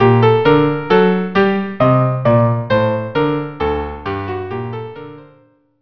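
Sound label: G minor violin cello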